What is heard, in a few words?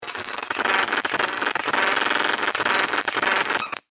Scifi, Communication, Scramble, Glitch, Distortion; Long, Science, Fiction; Communication Sound Effects